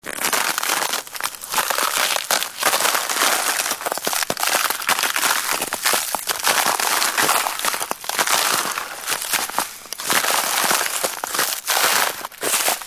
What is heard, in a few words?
Walking On Ice, Crunching sound of footsteps on ice; Snow; Weather